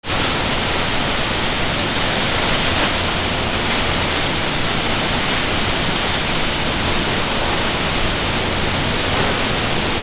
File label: WATER